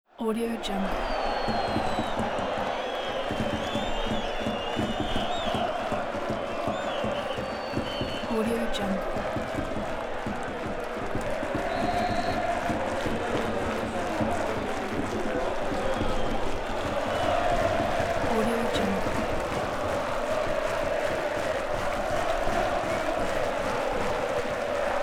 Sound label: loud arena crowd stadium